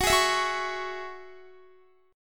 Gb 7th Suspended 2nd Gb down Guitar; Gb7sus2; strummed

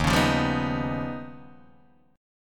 D sharp Augmented 9th D sharp Guitar Open D

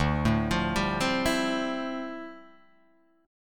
D Minor 11th D down Guitar DADGAD

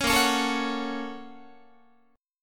Bb Minor Major 7th Sharp 5th, Guitar; BbmM7#5; strummed; 16,18,16,19,17,17; JGuitar

